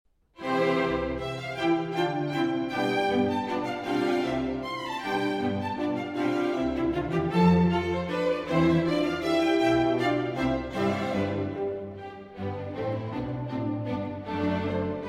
Concerto For Harp and Orchestra in C Major III. Allegro